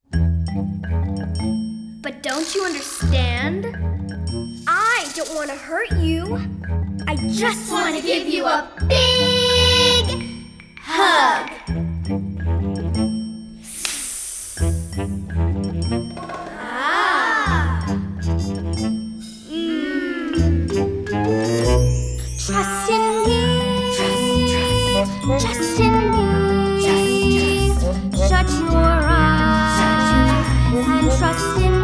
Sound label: vocals